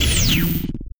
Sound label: CUTTER PEACE DAMAGE; HOBOCUTTER; Mob